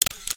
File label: camera click